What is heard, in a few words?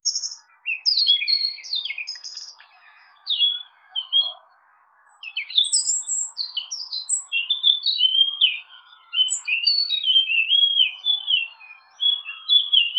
the rooster sings in the, crow